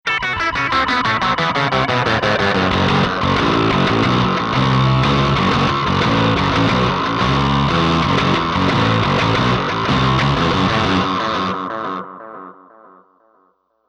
Hardwire DL8 Lo Fi 8 String Vox Satchurator Electro Harmonix Ring Thing Roland JC120; Jaden Rose; Roland JC120s